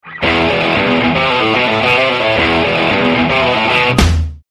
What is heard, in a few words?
metal SMS